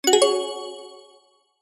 multimedia game sound mobile game warm positive tone